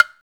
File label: CLAVE, BASIC DRUMSET